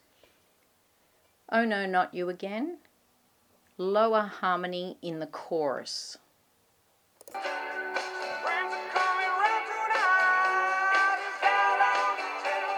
Chorus Low Harmony

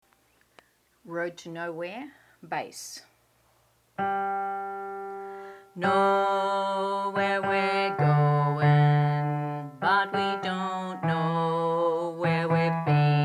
Bass

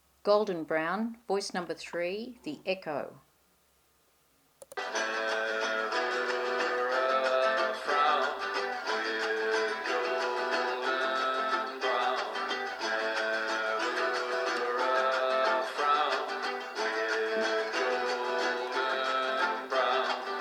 voice echo corrected

